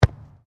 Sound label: SOCCER BALL KICK FROM HANDS